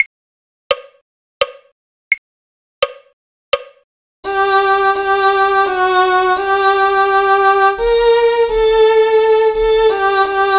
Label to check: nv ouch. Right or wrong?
Wrong